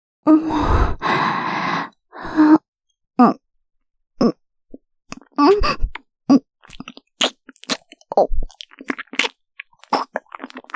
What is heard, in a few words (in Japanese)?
感じるキス